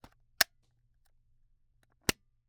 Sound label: Camera, Kinusa K77, 50mm lens, plastic, film compartment, open close, creak, pop; Eiravaein Works; Vaeyan IV